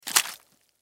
Slush Impact Drop Ground Wet Splat; Ice; Weather; Ice Sound Effects; Blastwave

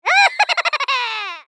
Evil Laugh, Cartoon, Male; Cartoon Sound Design; BlastWave

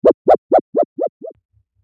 Wobble Board Deluxe, Toy; Miscellaneous Toy Sound Effects

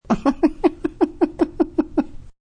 Woman Chuckle, Short subtle woman's chuckle; Laughter; Voice

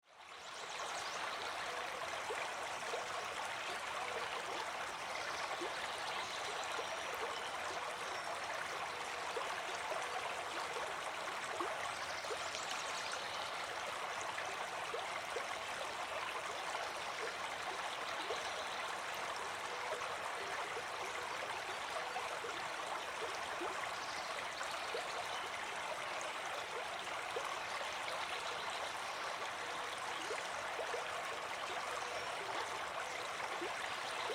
Ambience River Forest; Rivers; Nature

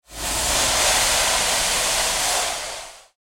Science Fiction Pneumatic Suction Vacuum; Sci Fi Sound Effects; Sci-Fi (Sound Effects:Science Fiction); SFX Bible 6

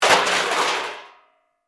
Crash Metal Object, Crash, Metal, Objects; Crashes

